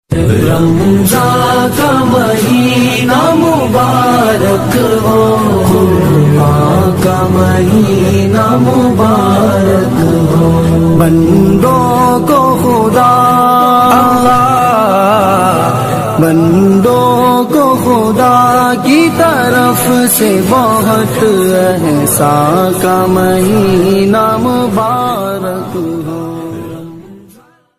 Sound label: islamic ringtone